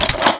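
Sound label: crossbow reload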